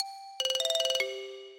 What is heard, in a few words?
notification